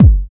tight steel kick drum sample g key, Royality free bass drum tuned to the G note.